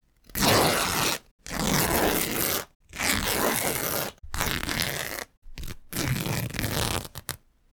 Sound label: Cloth Tearing And Ripping Flannel Cotton Scraps Close Mic Long Fast